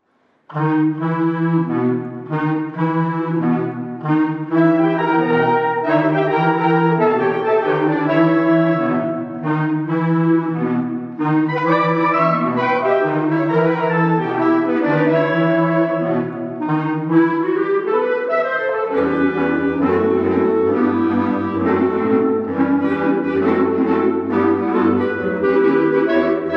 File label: klarinettissima